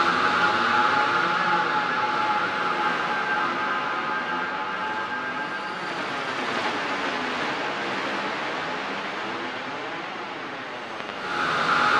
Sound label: AMBNT 160; eJay; Ambient